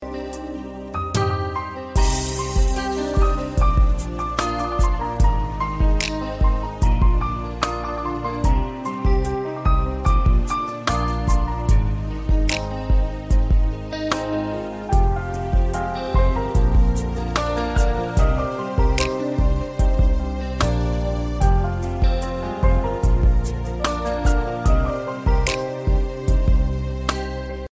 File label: most romantic ringtone